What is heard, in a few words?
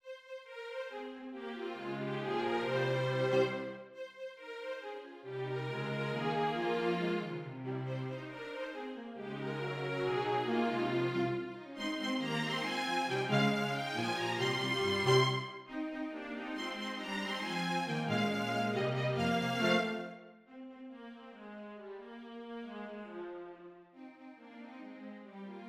string quartet